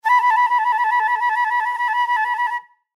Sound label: Flute Trill; Woodwinds; Blastwave